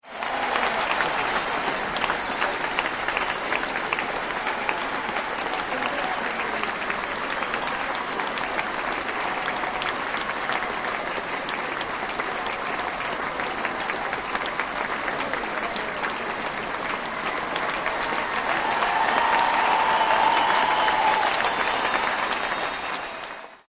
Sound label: Applause Long; Applause Light; Partners In Rhyme